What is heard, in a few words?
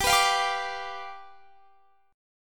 G 7th G down Guitar Standard; G7 strummed; JGuitar